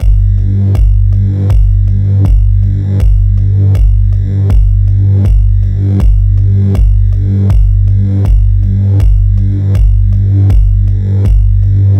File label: Trailer Pulse Robot Pulse G 80 BPM; Ghosthack